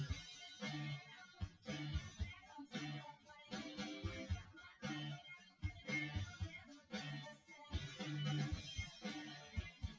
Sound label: w drums synth conv 250ms 5cm sim; SiSEC08; SiSEC underdetermined